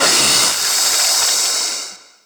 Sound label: spider die mono